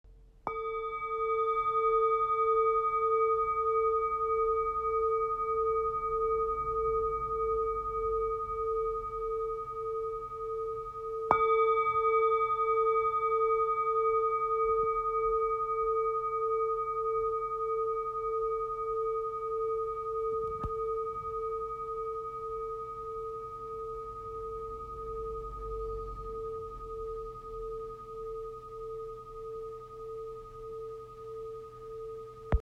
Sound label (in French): Bol 13 cm